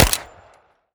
mech, weapons uzi; Urban-Coalition; Decay; arccw ud

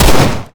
fire, glock; Urban-Coalition; Decay; arccw ud

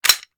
mech, weapons; CopsNRobbers

